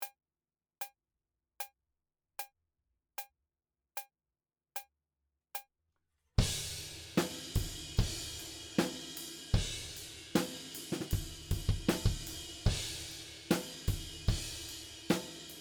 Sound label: Drums ONLY; Pre Pro